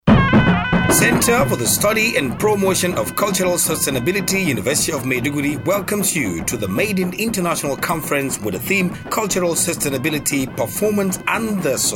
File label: jingle; 2018 Conference Center for SPCS